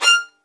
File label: XLD1 F6, LOUD VIOLINS; Q Up Arts; Denny Jaeger Master Violin Library